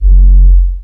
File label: Laser C Fire